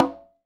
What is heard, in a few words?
CONGA; Sonic Reality; Interactive Drum Kits